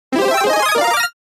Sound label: mushroom sound; nsmbwii